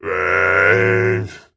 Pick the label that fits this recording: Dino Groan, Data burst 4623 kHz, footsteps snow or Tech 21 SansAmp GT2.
Dino Groan